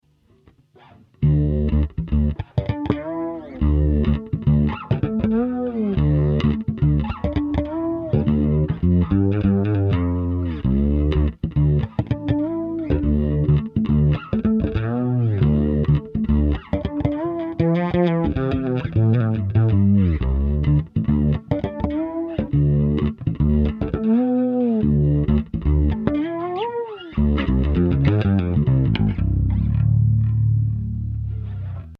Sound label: Comp Liquifier Fretless; Ampeg Opto